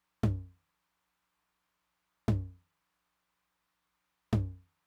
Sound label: high tom, Roland TR-909; drum machine; Roland TR-909 Ableton Project